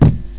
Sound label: land